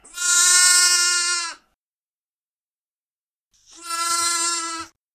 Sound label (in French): chamois